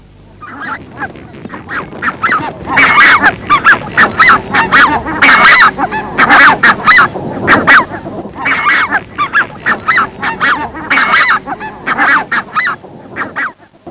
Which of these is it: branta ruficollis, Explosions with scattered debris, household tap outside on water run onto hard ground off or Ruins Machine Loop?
branta ruficollis